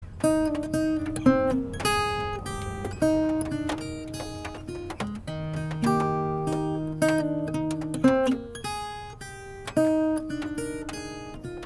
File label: guitar minuet